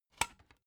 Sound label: dvd case remove dvd